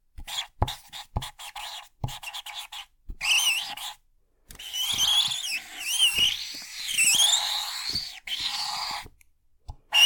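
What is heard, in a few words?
draw with marker, マジックで絵を描く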